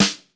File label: ROOM S, ROOM KITS; Crypton; DrumBase5000